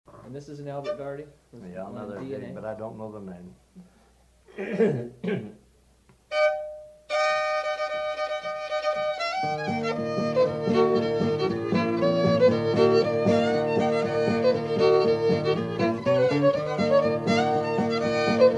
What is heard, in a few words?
D and A tune; greatlakes; lotus